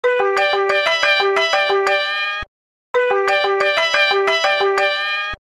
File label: Iphone